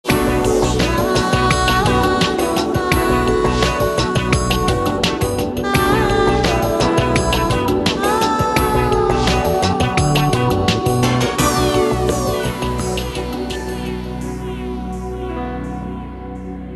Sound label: crystal, phonetones Motorola Q11